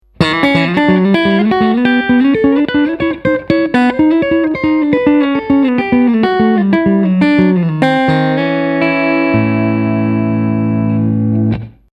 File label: Neck Single